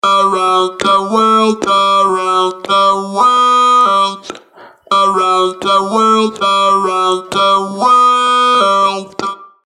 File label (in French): talkbox; comment fabriquer sa propre talkbox